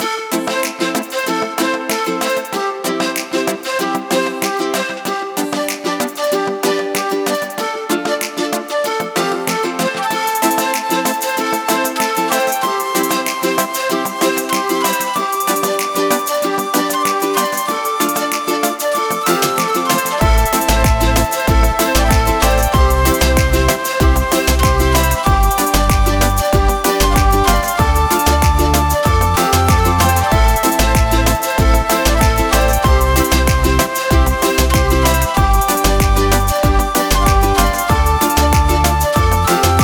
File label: dance no voices